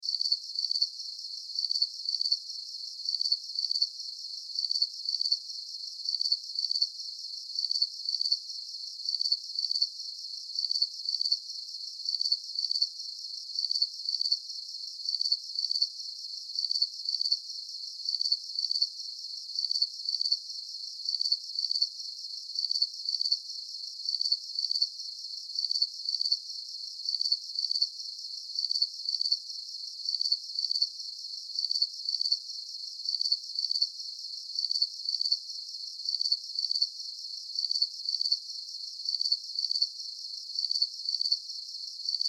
Insect Chirping Bed Background Night; Insect Voice Sound Effects; SFX Bible 6

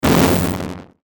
Static Swell Short Burst, Static Stutter Swell; Production Element Imaging Element Accent Transition; Blastwave; Static Sound Effects

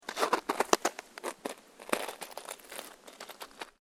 SNOW CREAK; Material components for constructing more complex sounds; Snow (Sound Effects:Weather)

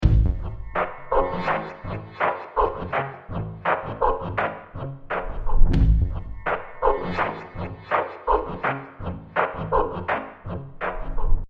Droid Drum Loop, robot; Tubs The Droid

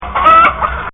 Hen, Birds; Animals

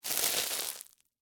Crunch Ice Cream Cone, Soft, Debris; Blast Wave

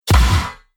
Cinematic Strike Metal, trailer sound effects

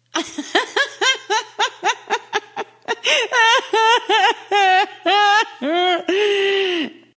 Female Drunk Laugh; Drunk female talking; Talking (Sound Effects:Voice)

